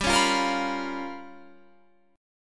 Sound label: G 7th Sharp 9th Flat 5th G